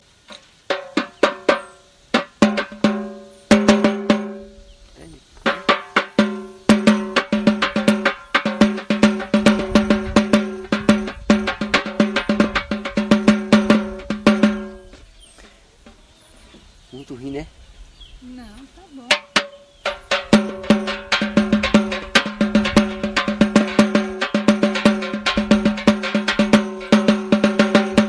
toque

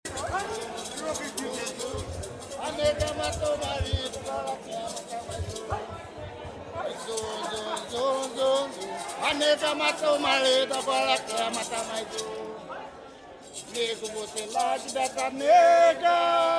batuque de umbigada; tiete; zum zum zum

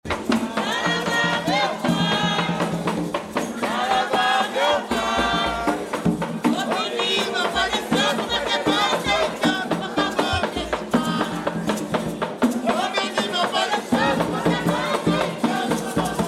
batuque de umbigada tiete sarava

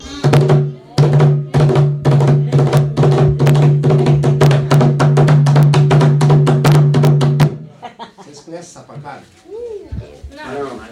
batidas ritmos

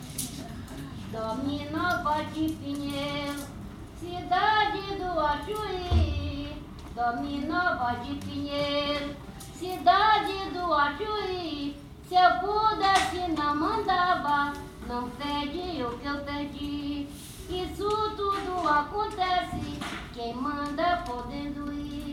fanti baiao de princesas pinheiro; Baião de Princesas é um ritual especialíssimo e único que acontece apenas uma vez ao ano

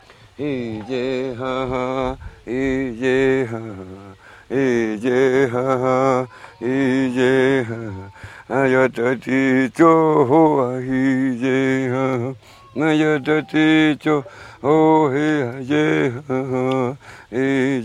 timbiras

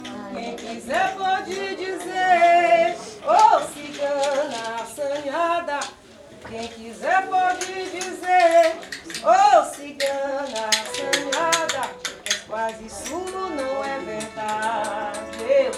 fanti baiao de princesas quem quiser; Baião de Princesas é um ritual especialíssimo e único que acontece apenas uma vez ao ano

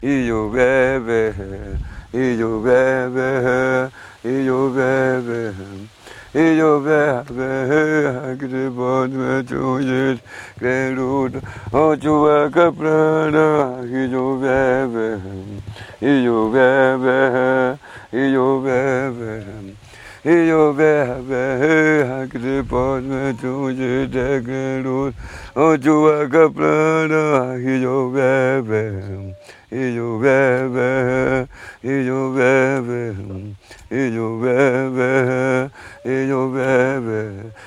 timbiras

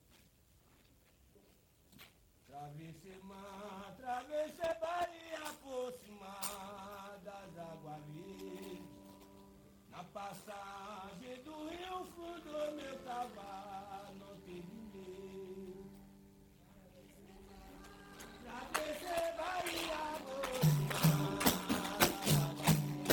fanti baiao de princesas travessei mar; Baião de Princesas é um ritual especialíssimo e único que acontece apenas uma vez ao ano

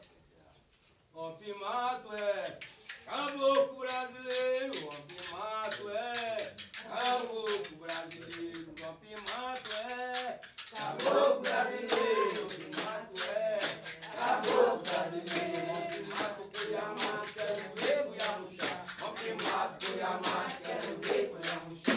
fanti baiao de princesas

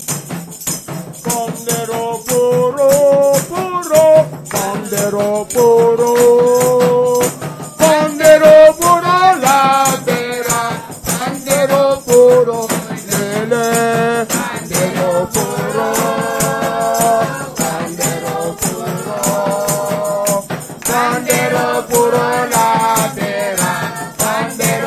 pandeiro; Burrinha; pandeiro furou

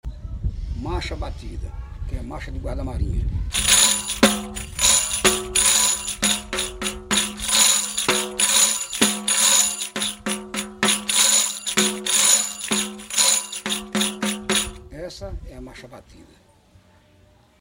marcha batida

